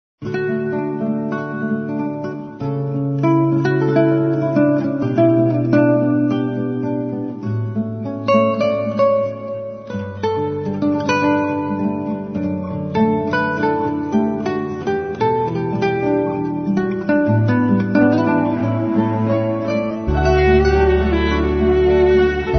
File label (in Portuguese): instrumental